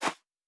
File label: Footstep Sand Running